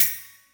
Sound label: Hats, Odd